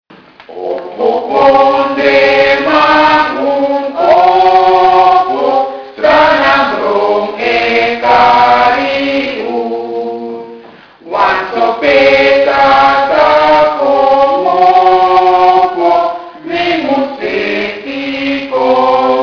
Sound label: anthem, Sranan; PeaceCorps